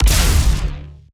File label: Lasrgun Ballista Fire Long